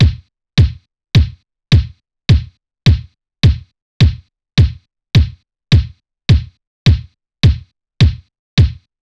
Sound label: Freeze 80s Kick; PSR-90; Arpeggio to PSR-90 Project; Ableton Projects